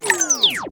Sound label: Tape Stop